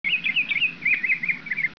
birds

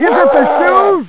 SOLD DIE

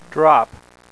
DROP

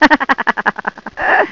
laugh

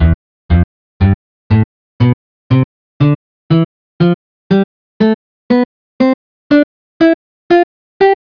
GUITAR

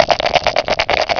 ROLL, DICE

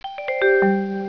chimes; Windows

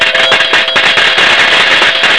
payoff

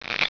shuffle

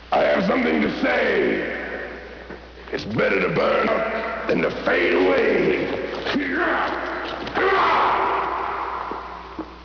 burn up

